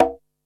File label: KOMMERZDRUMS